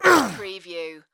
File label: Male Grunt, Human Injury, Pain, Vocal Ugh, Ouch